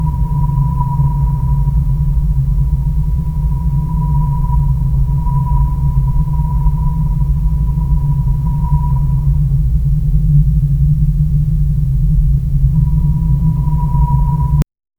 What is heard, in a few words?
sound of distant city noi